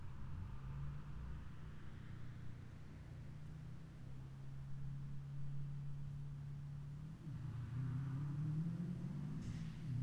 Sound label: track corner Subaru WRX stereo, Race Track Corner; SoundHolder